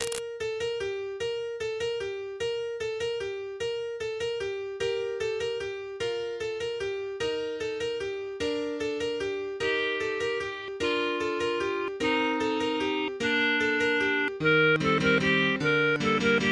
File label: carol of bell, MIDI